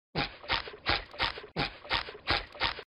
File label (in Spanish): Bob Esponja Caminando